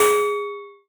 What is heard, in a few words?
metal hit